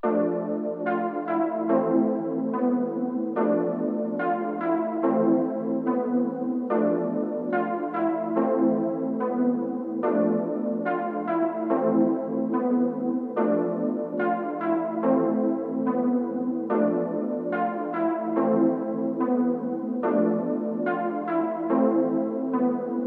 144 BPM A Min Pluck; Trap; Melodies; Loop Stems; Cymatics; Trap Starter Pack; Betrayed

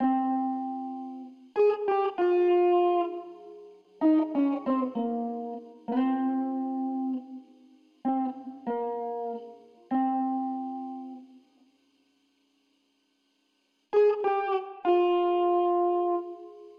Nostalgic Melody Loop - 97 BPM G# Maj Top Line; Melodies; Loop Stems; Cymatics; Oracle Sample Pack

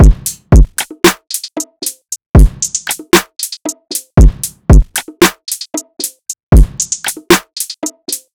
Drum Loop - 115 BPM

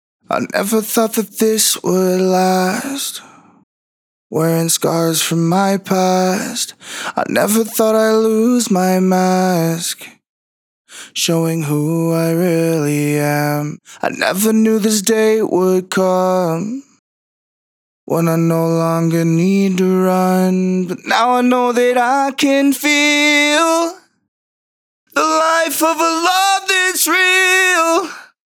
Intro Bmin 140bpm Dry, Male Acapella; Ghosthack

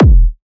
Techno, Kick; Techno Sounds 2024

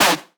synth screech oneshot D# dry; Synth Hits; GhostHack

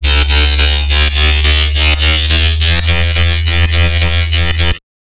Bassline, Dubstep and Trap

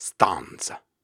Syllables and Imaginary Words, Viking Vocal, Hard

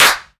Big Clap; Claps; Future Bass; Drums; One Shots

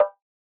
Vintage Percussion White; Cymatics; Mystery Pack Vol 4

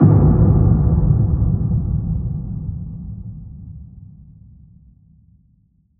Deep Impact; Psytrance; FX

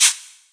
Shaker, Amapiano; Afro Drums; One Shots; Ghosthack; Drum Mega Bundle

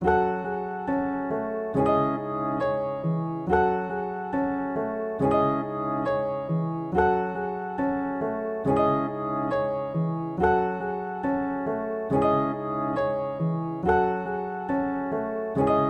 139 BPM C# Min Piano; Loop Stems; Cymatics; Benz

